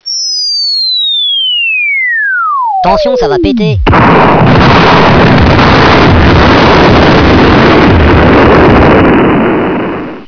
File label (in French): tention